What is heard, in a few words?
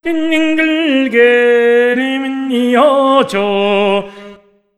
MONGOL V, Asian Traditions